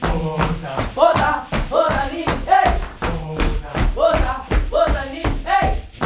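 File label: 80 bpm, group chants; Spectrasonics; Supreme Beats; African Contemporary; Wosa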